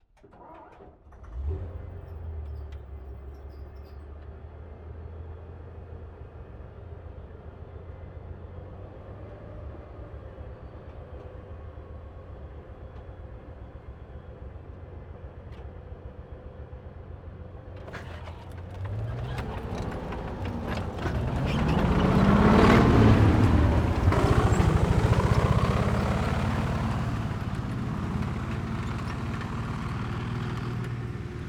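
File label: panzer iii exterior fast by stop off tracking; Panzer III Ausf. M; Pole Position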